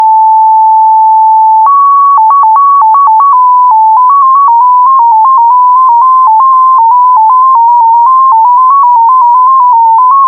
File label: mfsk8